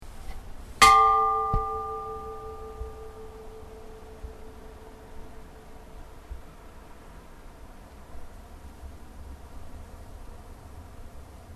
hvammskirkja i hvammssveit likhringing, kirkjuklukkur

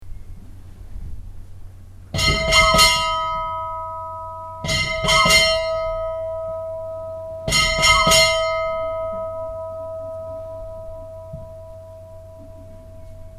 stadarbakkakirkja messa lok, kirkjuklukkur; Lok messu; Staðarbakkakirkja var reist árið 1890; Í turni kirkjunnar eru tvær klukkur

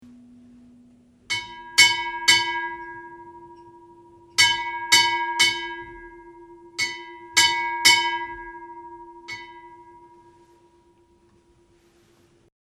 tungufellskirkja litla, kirkjuklukkur

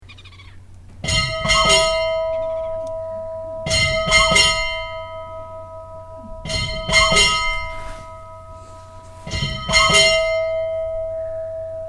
stadarbakkakirkja messa, kirkjuklukkur; 15 mín fyrir messu; Staðarbakkakirkja var reist árið 1890; Í turni kirkjunnar eru tvær klukkur

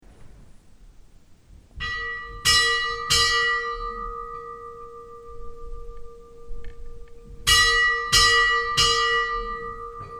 hjardarholskirkja stora, kirkjuklukkur

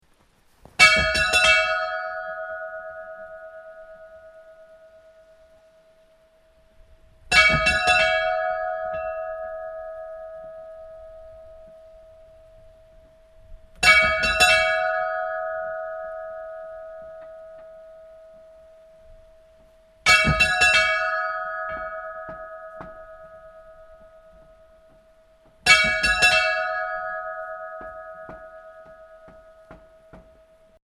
storavatnshornskirkja fyrir messu, kirkjuklukkur

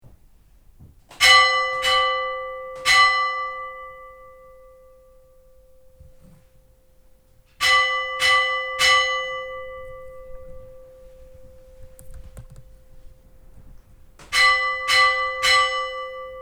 oddakirkja midja, kirkjuklukkur

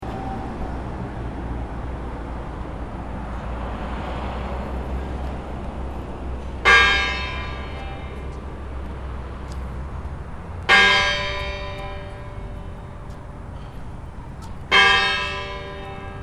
glerarkirkja 3x3, kirkjuklukkur

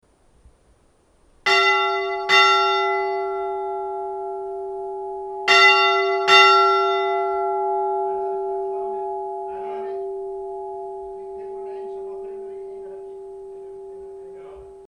grundarkirkja staerri, Kirkjuklukkur; eyjafjardar og thingeyrarprofastsdaemi

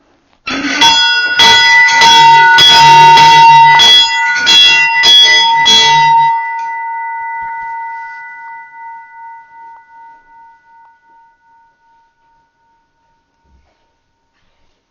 brunnholskirkja, kirkjuklukkur

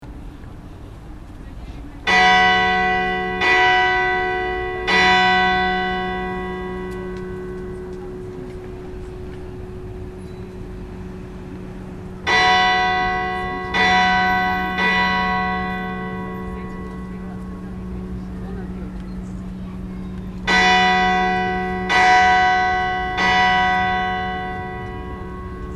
akureyrarkirkja stora 3x3, kirkjuklukkur

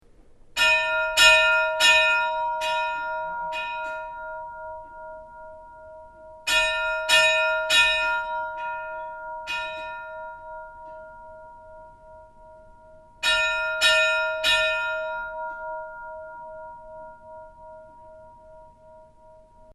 stora asskirkja staerri 3x3, kirkjuklukkur

